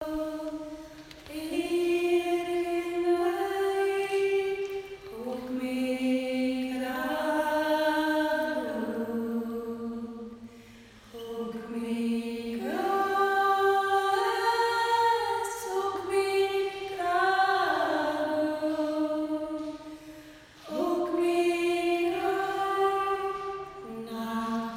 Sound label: gaelic choir